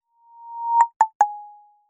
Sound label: UX Sound Warning